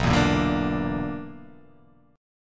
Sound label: B-Minor Major 7th Double Flat 5th